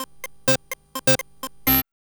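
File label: synth sequence; X-Static Goldmine 1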